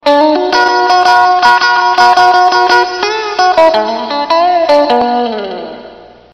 cool guitar ringtone; iphone 7

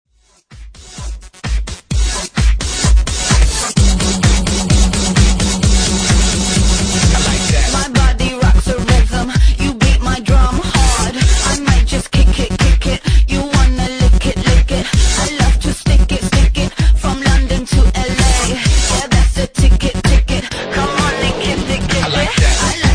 iphone 4s ringtone

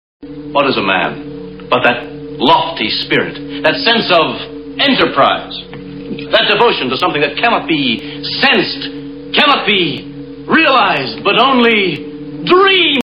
huawei ringtone

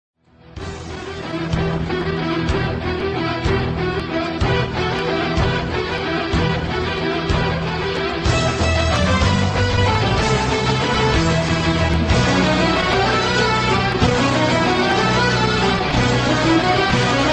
rock ringtone; iphone 7

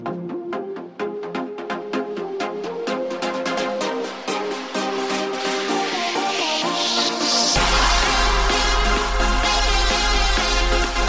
piano beat iphone se new mix ringtone

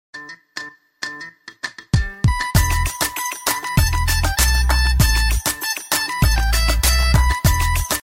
huawei mate 10 ringtone

